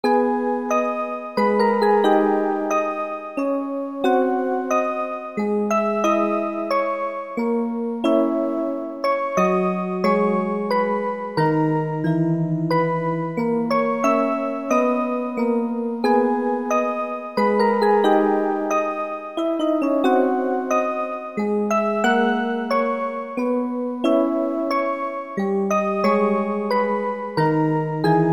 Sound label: minuet